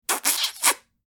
Grandfather short kiss

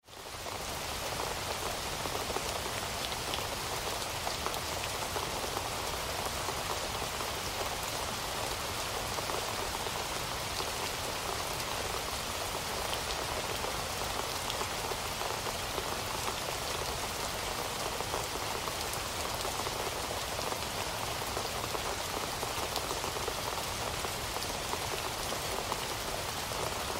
Rain in a rainforest ambience

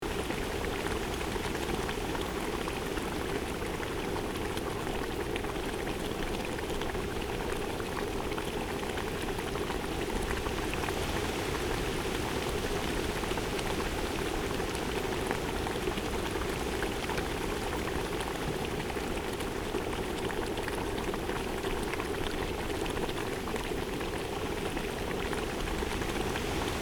Volcano fumarole ambience light rain loop; nature

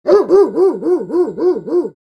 Sheepdog barking repeatedly barks

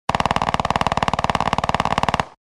machine gun burst distant perspective; AK-21

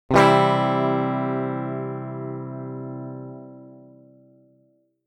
Electric guitar C major single hard strum

